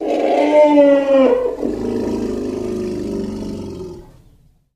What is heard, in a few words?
Elephant Roar Growl